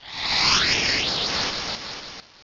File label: Silver Wind; pokerogue; battle anims